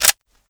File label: bolt pull; ak74u; garrysmod